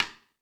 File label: FX Sounds, Drum Machines